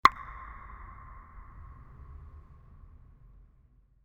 Percussive Elements

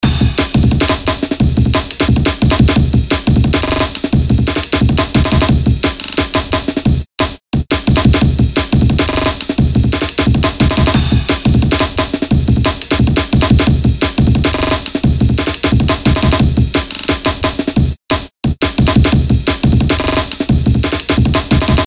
Intense Drums 176 bpm (loop); OpenBundle; Background Music and Loops

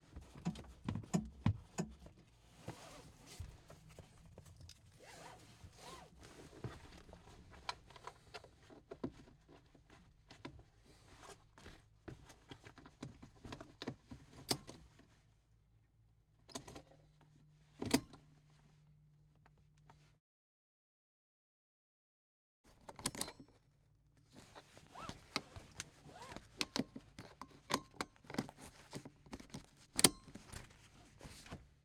Honda S2000 - Seat Belt Strap and Latch Movement; 2002; MS Decoded

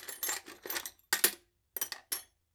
Screwdriver Removed From Toolbox; Construction; BBC Sound Effects Library

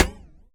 impact Plate light; Kenney's Sound Pack; Impact Sounds

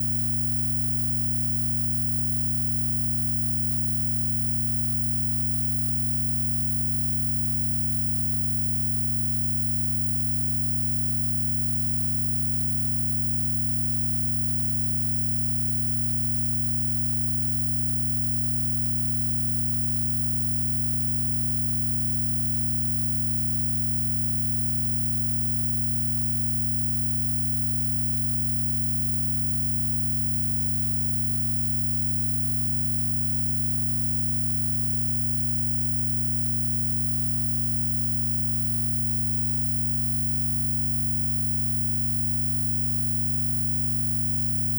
Signal Interference; Glitchedtones

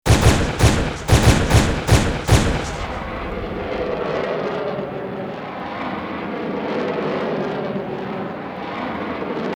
Bullet Time; FXHome; Bullet and Gun

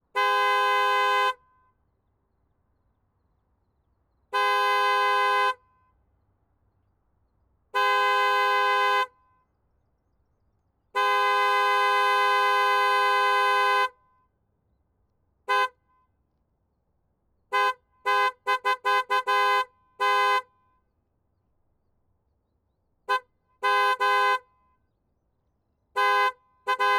BMW 760i Spot A Horn; FLYSOUND

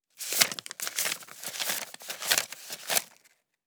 Counting Money Bills; The Sound Pack Tree

